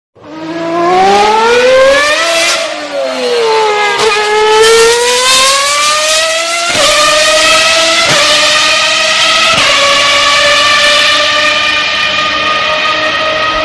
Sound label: formula 1 car sound ringtone